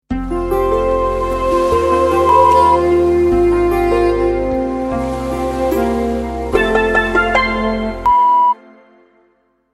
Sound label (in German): Sound Logo mit Sound Bett 5 Toene Dream